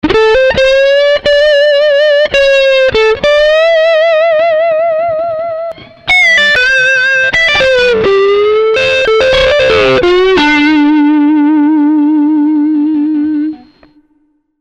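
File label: 60s Woman Tone, guitar overdrive; Nobels ODR-1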